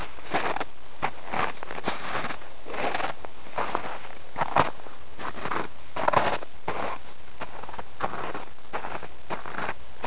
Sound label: snow walking